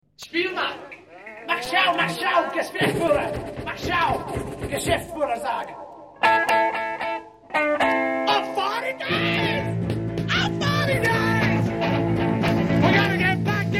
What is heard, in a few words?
Medley (instrumental jam)